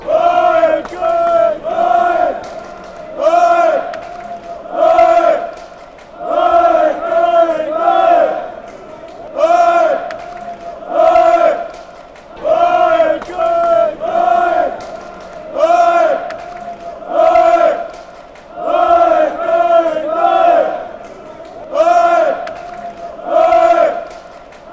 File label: aek athens chant